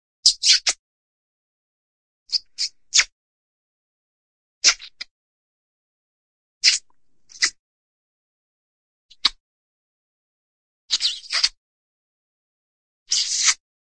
kiss, big kiss, human; cartoon

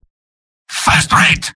spy positive vocalization; tf; mvm

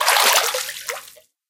water, footsteps

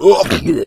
boomer pain short

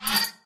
editor panel raise, ui; Source Engine; Portal 2

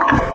zombine pain; npc; source engine; episodic

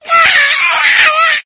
ignite, infected, female; Left 4 Dead 2; Source Engine

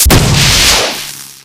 vort attack shoot

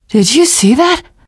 violence awe, teen girl, survivor voice; Left 4 Dead; Source Engine

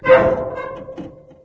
bin squeak short; video games; ambient; source engine; portal2; outland

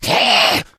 alert, infected

